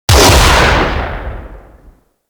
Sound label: Heavy Shotgun Shot A; Warframe; Tenno